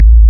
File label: DEEP, bass